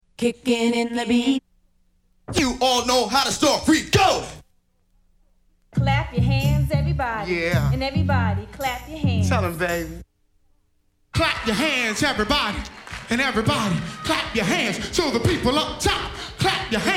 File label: samples scratches